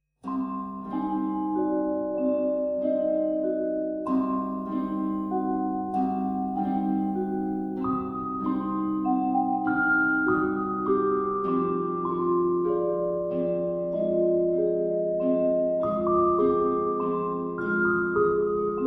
Cemetery Waltz (Choir)

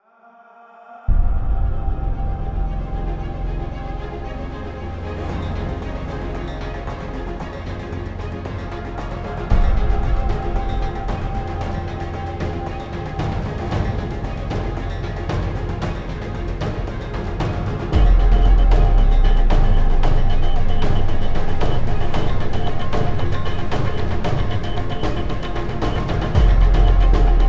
North Country (Orch)

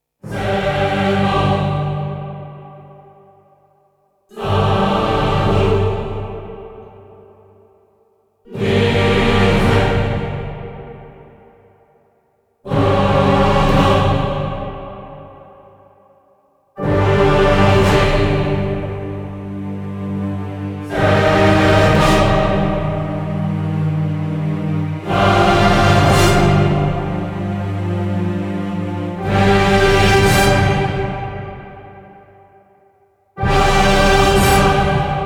Choir

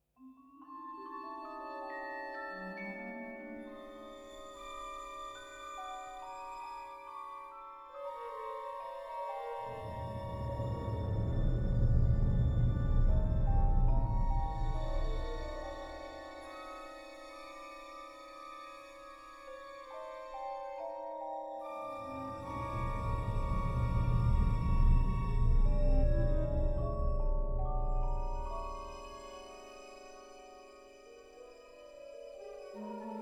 No Choir

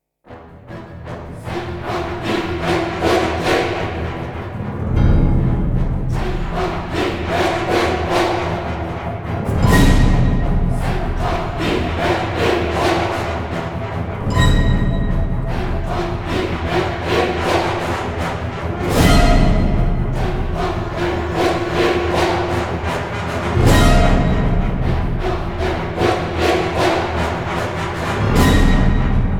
Choir

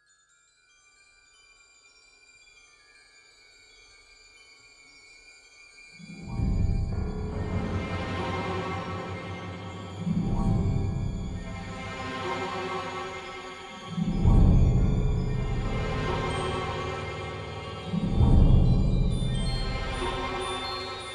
No Choir

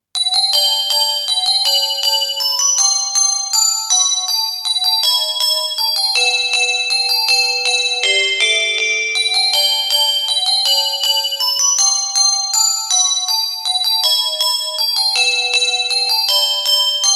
Glocken

